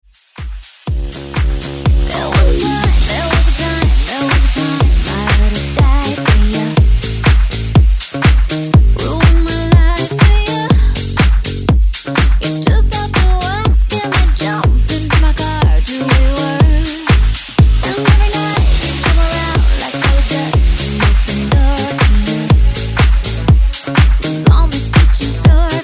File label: Dance